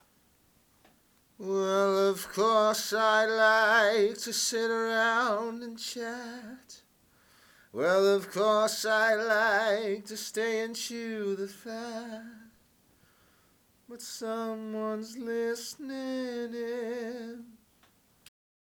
sample at2020

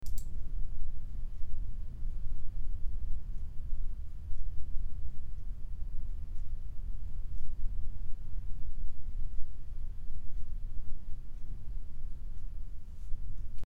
mxl 770 hum

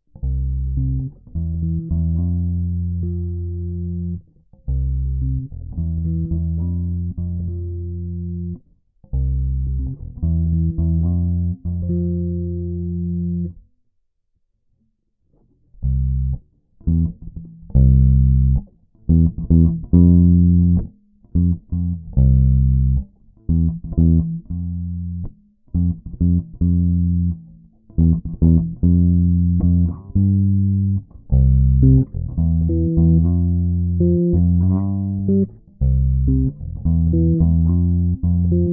bass sample

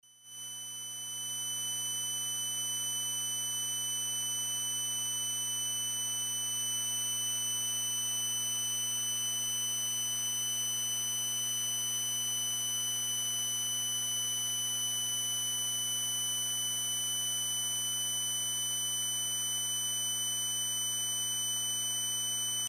high pitched noise

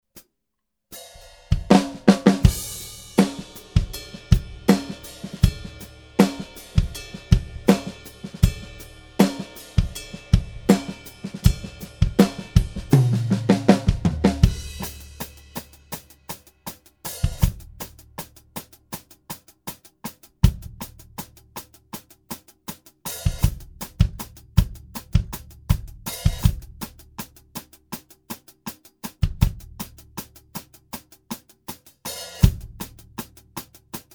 Drum test

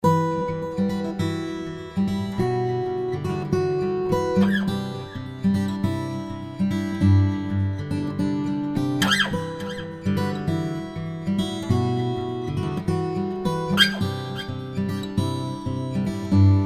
Folk Idea

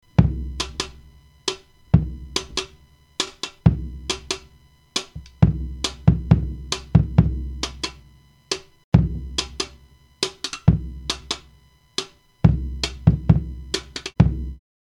Kick Rimshot Noise

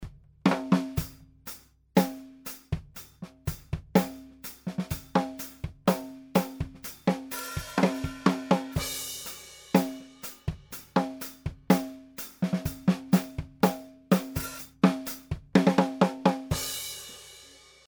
Snare and mono overhead

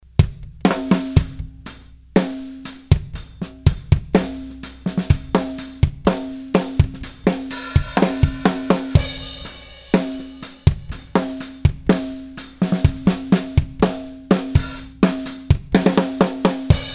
whole kit aligned from kick mixed a bit

